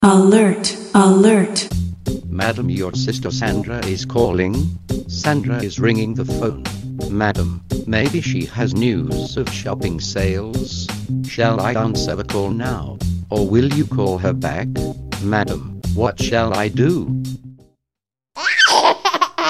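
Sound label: Is Calling R&B, ringtones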